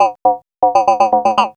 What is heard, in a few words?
POLYMOD; AMG - Vince Clarke Lucky Bastard